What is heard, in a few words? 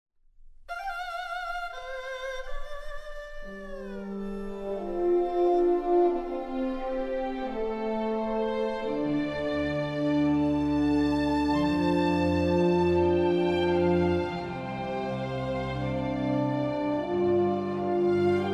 Music; Age of Empires III; cinematics